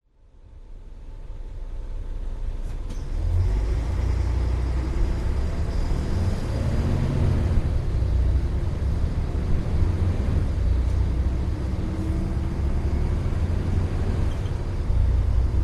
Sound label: bus trip; thereANDback; A bus journey stop to stop in glorious stereo